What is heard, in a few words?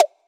PERC